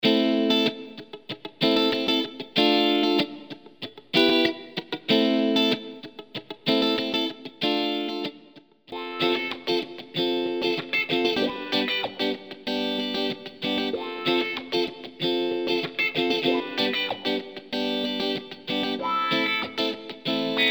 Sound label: clean live guitar, Ketron AUDYA; Canlı gitar örnekleri; Trakya Müzik-Türk Piyanist Birliği